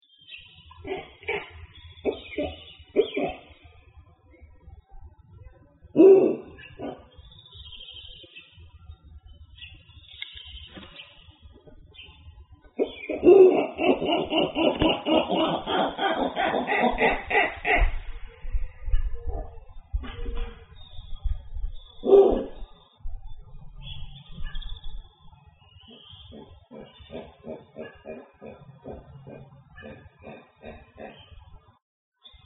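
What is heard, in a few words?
Snowy Owl male advertisement female response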